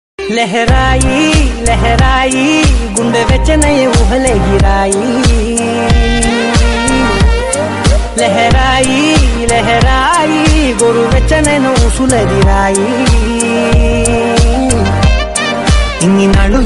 Song Tamil

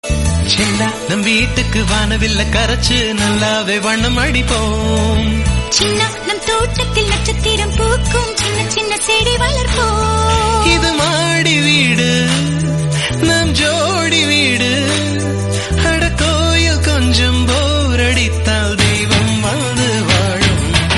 Tamil Song Ringtone

